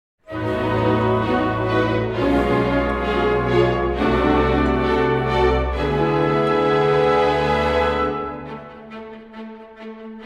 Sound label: fanfare